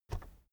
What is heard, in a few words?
keyboard external space push soft